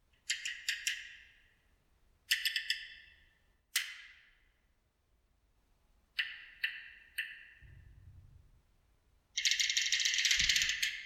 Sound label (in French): craquements